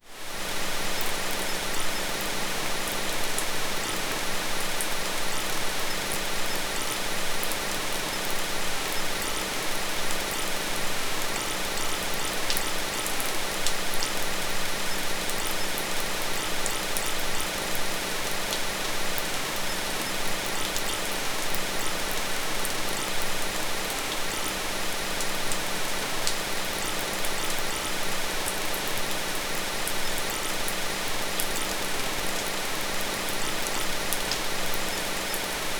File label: gentle continuous rain